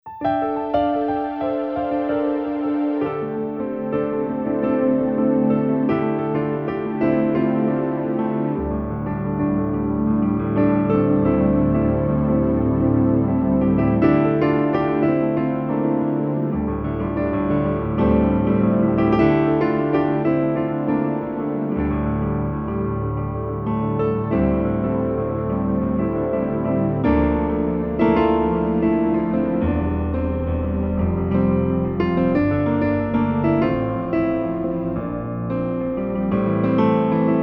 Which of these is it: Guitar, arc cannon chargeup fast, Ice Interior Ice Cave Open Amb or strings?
strings